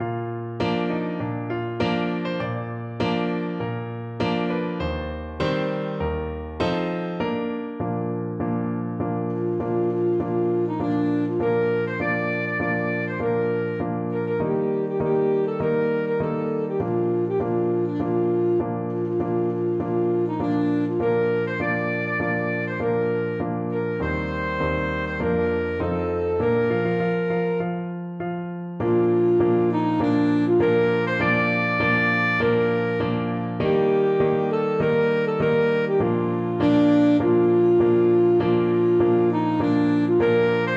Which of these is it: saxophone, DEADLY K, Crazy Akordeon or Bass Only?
saxophone